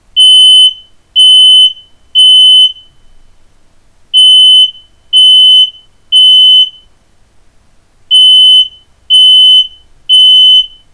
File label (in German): ikea rauchmelder alarm; ikea 29hik